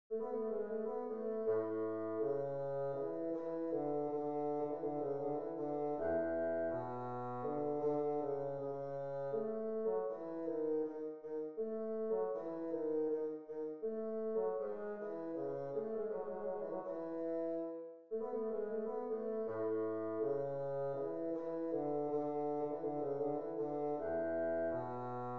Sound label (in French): menuet quintette cordes; boccherini luigi